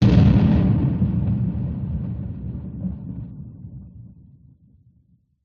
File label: explosion large boom 200m; zapsplat